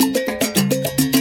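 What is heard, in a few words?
salsa G minor perc all; multitracks; G menor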